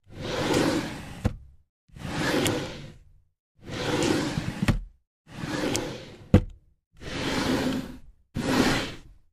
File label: Drawer Wood Open Close; drawers